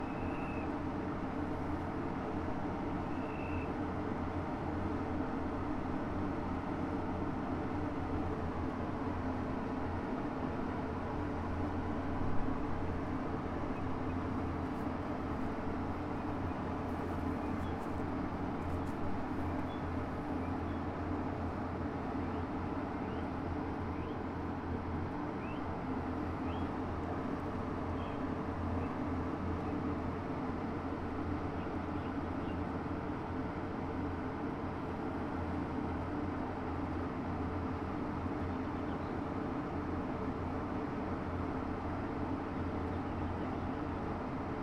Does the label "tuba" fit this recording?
No